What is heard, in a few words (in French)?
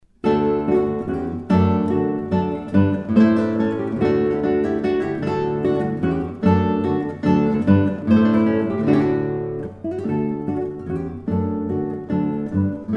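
Partita en ré Menuet, 2 guitares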